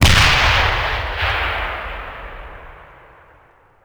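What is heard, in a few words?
bazooka soldier fire; WAR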